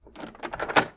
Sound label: Hotel Room Door Locking